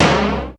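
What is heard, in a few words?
tom, funk kits; Crypton - DrumBase5000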